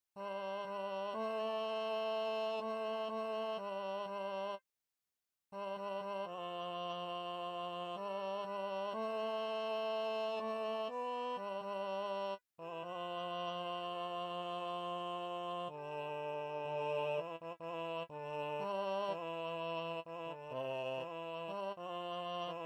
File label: Bottom Harmony